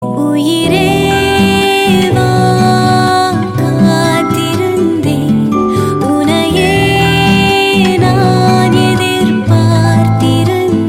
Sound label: Female Ringtone